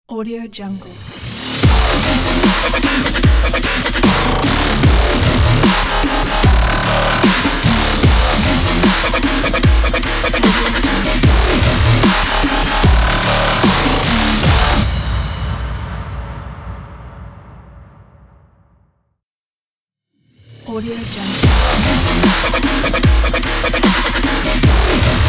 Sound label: dubstep logo intro